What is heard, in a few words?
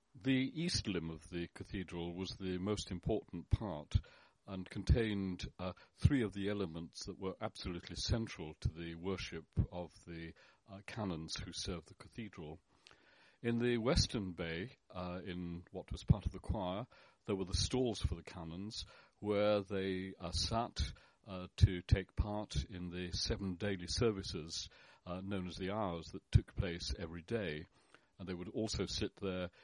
choir